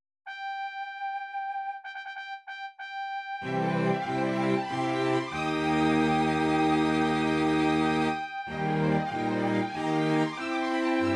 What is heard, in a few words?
fanfare; super nintendo dragon quest 5 japan